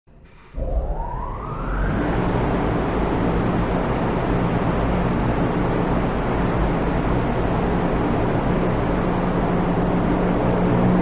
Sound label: Power Up Large Wind T, factory